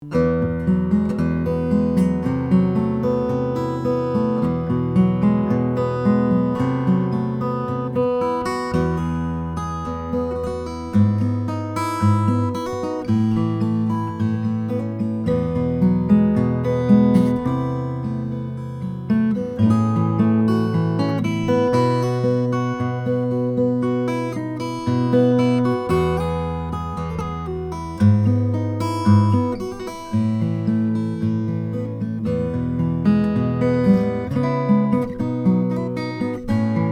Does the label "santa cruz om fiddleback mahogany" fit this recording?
Yes